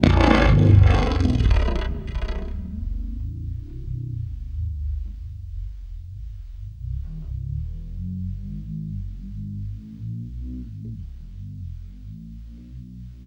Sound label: REV, Guitar; East West; Steve Stevens Guitar Samples Collection